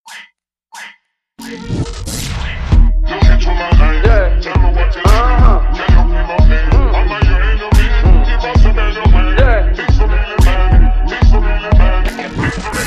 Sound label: riddim